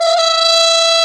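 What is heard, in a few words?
Plunger Mute, Brass; Roland L-CD702; Plunger & Wa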